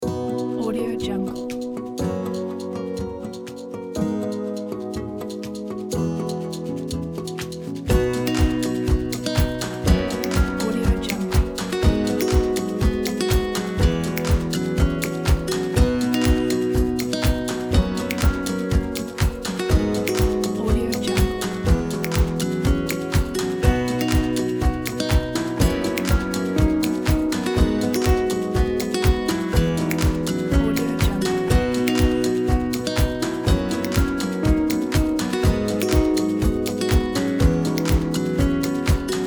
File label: indie world